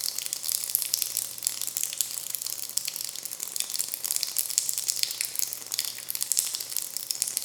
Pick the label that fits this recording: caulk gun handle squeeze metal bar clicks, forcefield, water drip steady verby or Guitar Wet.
water drip steady verby